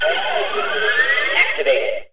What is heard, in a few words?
STINGER ON